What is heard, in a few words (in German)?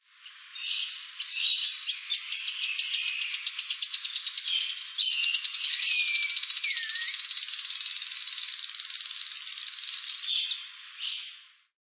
vogelruf acrobata